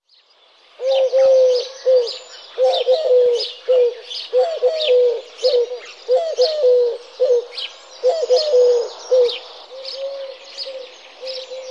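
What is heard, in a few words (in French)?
Tourterelle turque, oiseaux; birds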